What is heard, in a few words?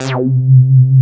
bass C1